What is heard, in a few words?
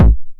909 BD Tape Sat